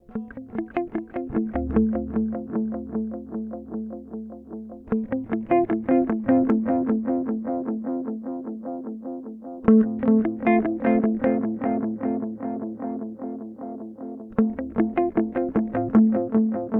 Oil Can Delay; Source Audio Collider

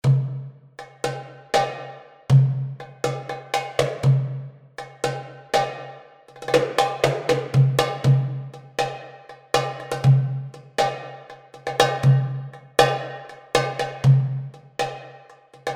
Darbuka Grooves

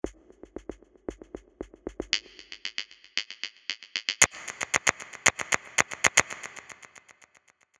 Geigercounter

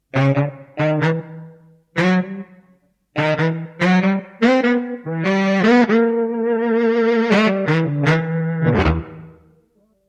Yamaha SY77 Preset

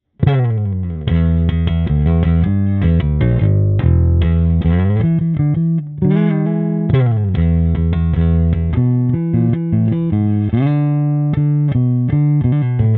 Preci Thump neutral Amp

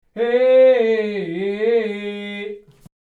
Inside DIY Box Absorbing Test

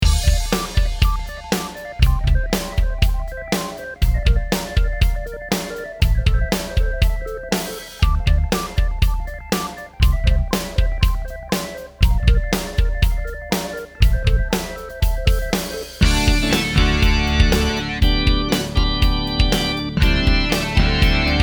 Hammond XK 4 Full Hammond

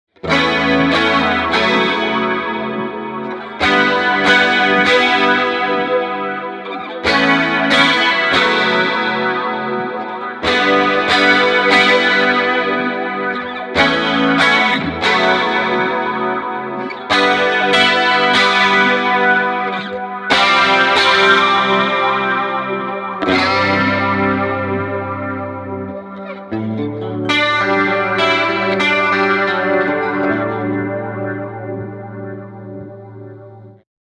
Valeton GP 200JR BIG CLEAN

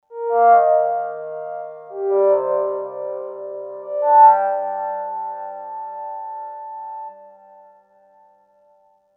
OPX-4 Bending Metal

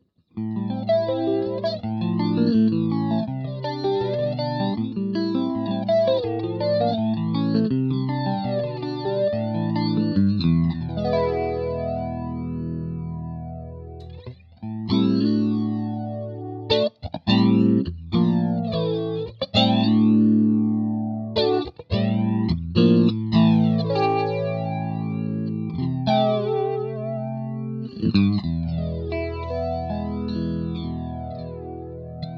Flanger

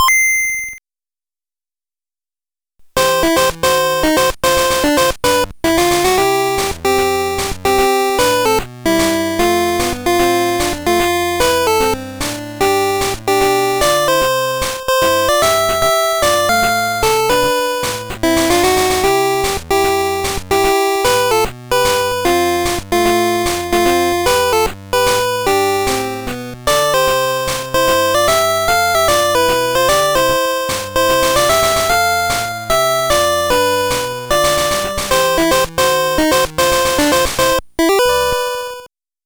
Sony DRE 2000 Game Boy Dry

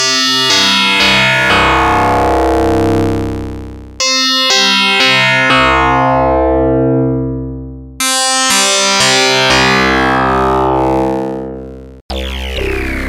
fmsync

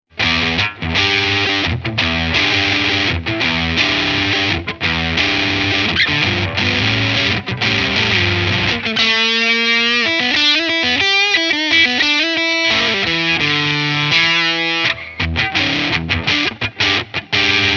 Zonk Machine clean Amp FUZZ max; British Pedal Company Compact Series

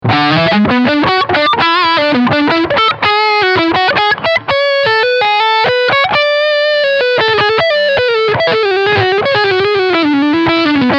Leadline Front PU

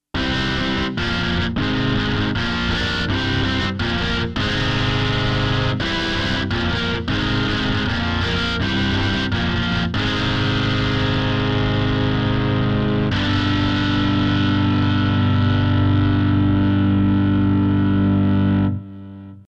Charlie Lab Digitar

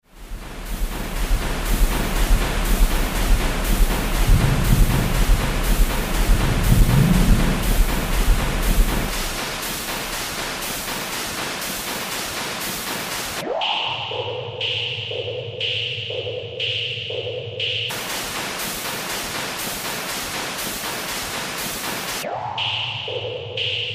Industrial

